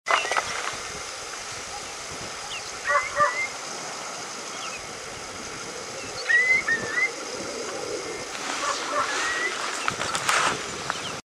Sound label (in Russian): kronshnep bolshojj